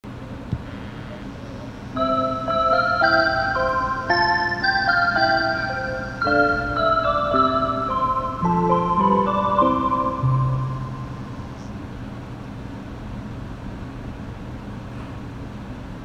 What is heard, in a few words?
previous chime; tokai